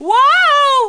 Woah; Demo Man

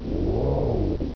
Servo; F⁄A-18 Hornet; F⁄A-18 Hornet 2.0

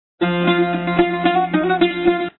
bouzouki; maya miguel global groovin

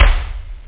kick; OctaMED SoundStudio V1; jazzies samples; perc

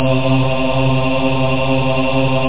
choir

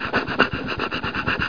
scribble

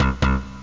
mirage bass pair; OctaMED SoundStudio V1

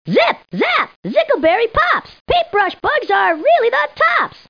pops; zickleberry

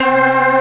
choir bell

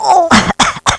Death; BOOM

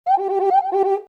Cuica; MOBICLIC 64

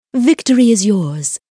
victory; 1944 Battle Of The Bulge; english

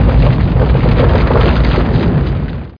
bug h open; Starship Troopers; bugs

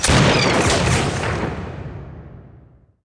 Mushket; Alexander

